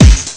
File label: dance snare; Amiga Soundtracker Sample Packs